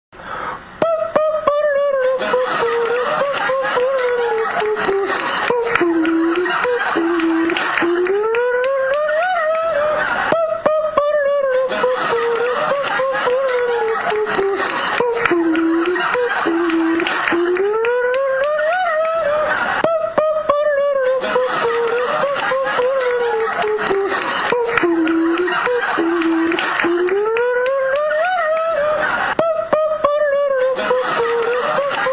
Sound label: ring; fxrant; colbert